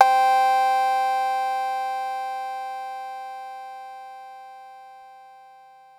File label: Cowbell Syn, Korg Percussion